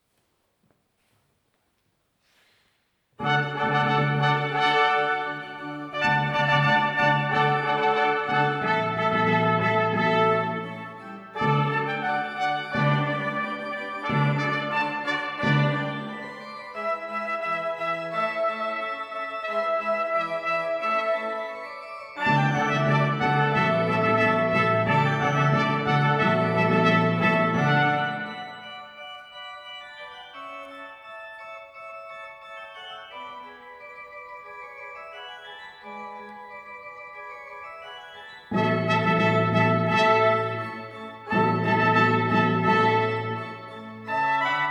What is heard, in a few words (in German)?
Trompetenensemble; ChriKi